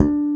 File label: FINGER BASS; AKAI S6000 CD-ROM - Volume 1